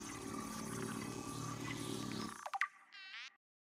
scan; subnautica

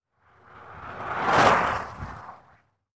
car drive

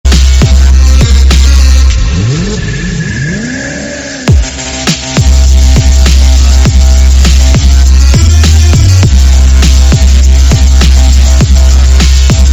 arabic trap

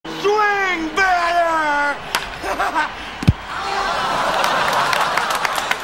baseball hit

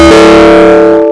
windows 10 bass boosted error

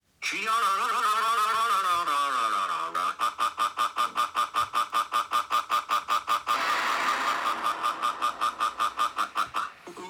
grrrrrrrrrrrrr

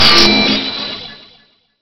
stinger

